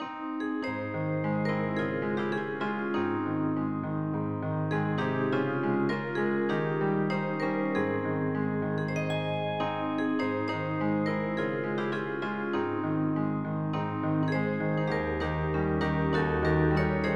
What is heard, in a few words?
ALOHA